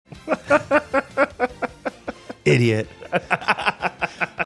laughing saying idiot

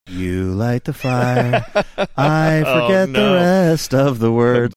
singing you light fire i forget rest of words

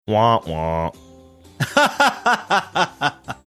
wah wah

